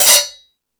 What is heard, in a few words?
Discoball, drum machine